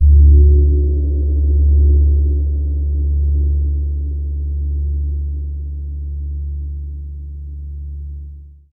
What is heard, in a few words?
GONG P; ILIO; Synclavier Percussion 2; World & Orchestral